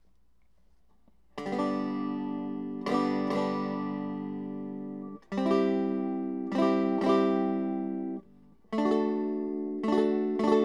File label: brickhouse g amped